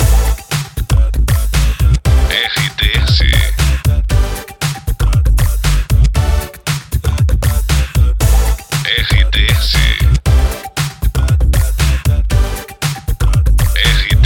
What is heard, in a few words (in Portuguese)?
TRILHA PARA LOCUCAO; CLUBE FM PIRAPORA; Pacote Rádio Clube FM, Pirapora/MG. Emissora com perfil Jovem